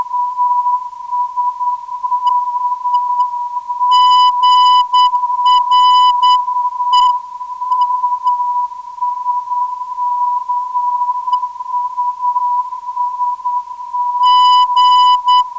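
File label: USK 414kHz, non-directional beacon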